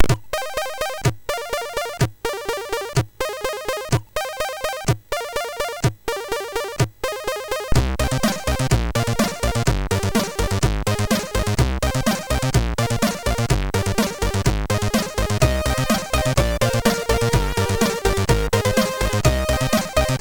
Disco sid MOS6581R2; soasc

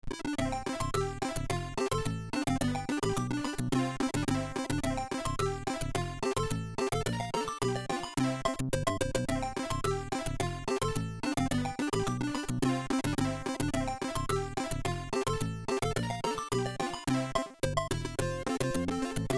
Firefighter Game Music, sid

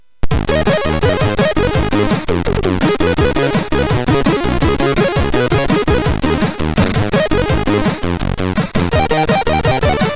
sid MOS6581R2, 2 channel; soasc

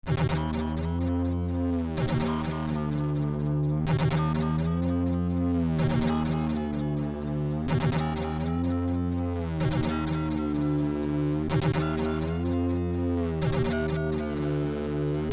Scary End Boss, sid; Orcus

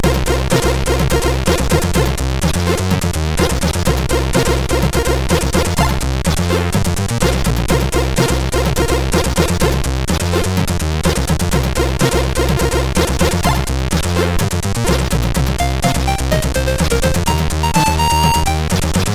sid MOS6581R2; Triad; soasc

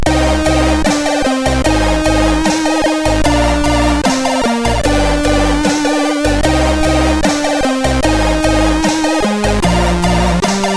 Sad Intro, MOS6581R2; sid; soasc

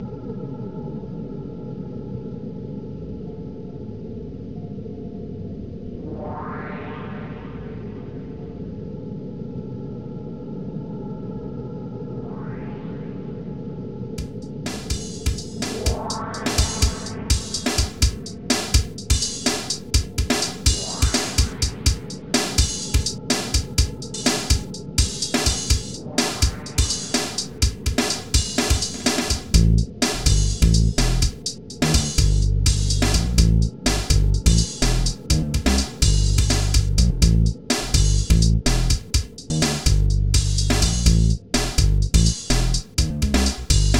Weird Drums; ft2

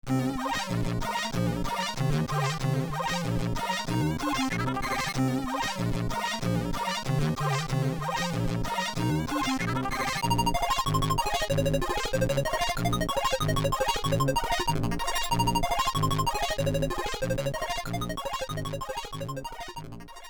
Orcus Atmosphere Boss; sid

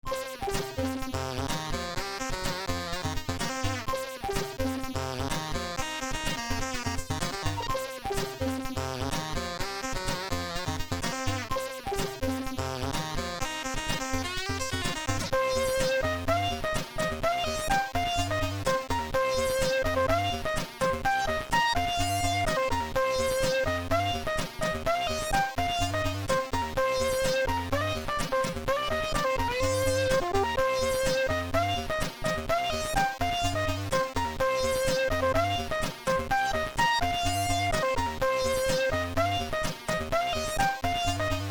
Guitaring Level, sid; Orcus

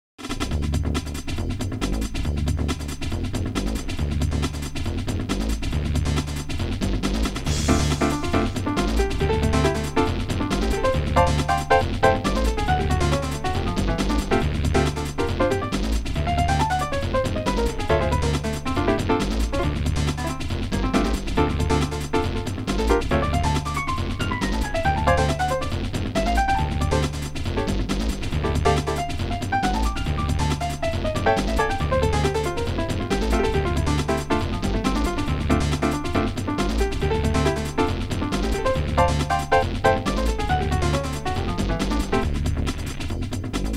Acid Jazz; ft2

